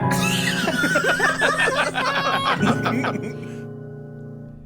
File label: laugh track